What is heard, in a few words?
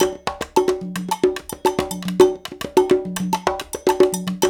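108 bpm, Latin; Spectrasonics; Supreme Beats; African Contemporary; Latin MM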